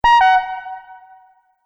Multimedia Event Notification, beep sound effect

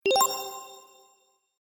Magic Idea; Magic Sound Effects

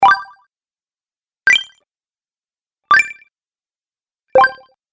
Magical Tokens Collection, video game

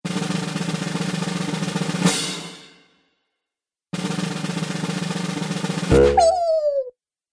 Drum Roll With Jump, cartoon music

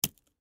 Knife Stick Blade Into Dirt; Hand Tools; Blastwave; Foley

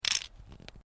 AEROSOLE SPRAY CAN MOVE; Can of spray paint; Spraying; Objects

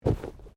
Bodymovement Fall Light

